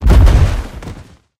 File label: tank death body fall; Left 4 Dead; Source Engine